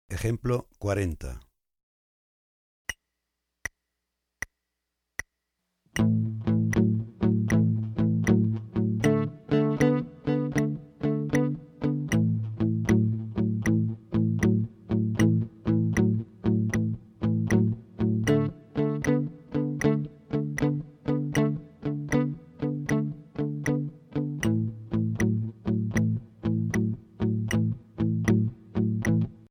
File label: The Shuffle Pattern